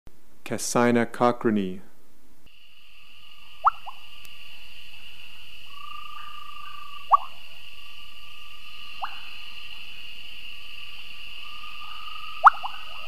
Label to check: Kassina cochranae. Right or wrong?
Right